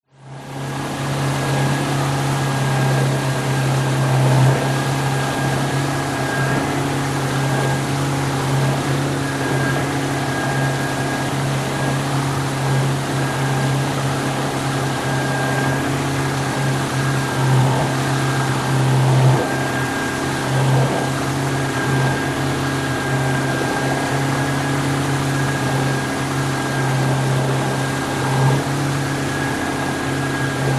transport motorboat outboard 16 ft fiberglass drive fast increase power